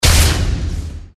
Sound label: pickup speed; disneyxd; stuntastic